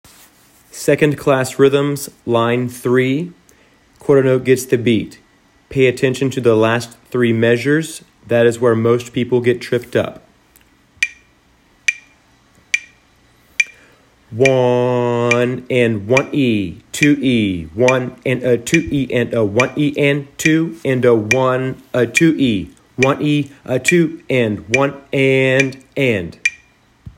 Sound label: rhythms